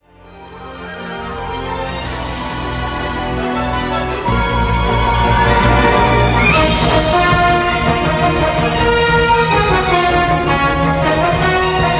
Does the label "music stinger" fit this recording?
Yes